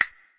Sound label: CASTANETS, Roland TD 7; DRUM MACHINES